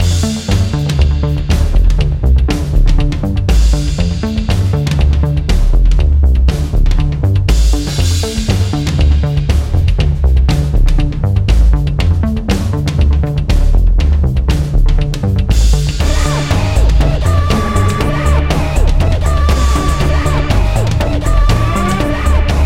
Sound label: Bass Killer